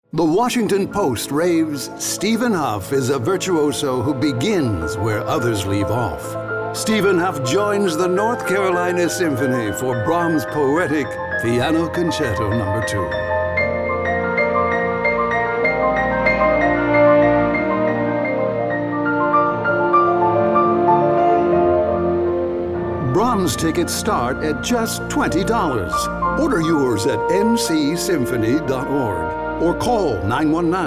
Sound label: Brahms Piano Concerto No. 2